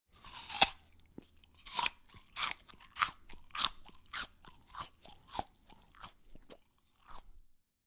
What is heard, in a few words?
Chewing Apple, Human, Chewing Apple, Eating Apple, Biting Apple